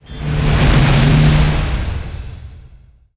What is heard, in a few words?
big Win Ramp Up; fairyred